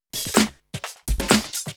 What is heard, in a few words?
Houseloops 128BPM; Vengeance Samples